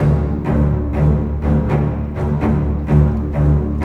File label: Cinematic Strings, Orchestral